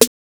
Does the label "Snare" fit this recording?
Yes